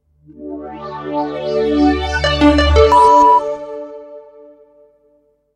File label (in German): Sound Logo 4 Toene; Soundlogos für Ihr Corporate Design